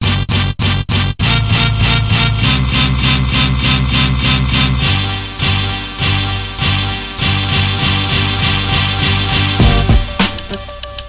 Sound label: beat